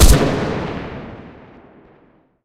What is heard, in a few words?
hs mk2 last shot, guns